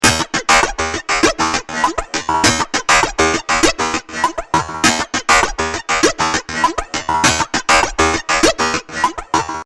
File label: Drum Loop 100 Bpm Space Boings; Music Loop Sound Effect; Percussive And Rhythmic; Seamless loop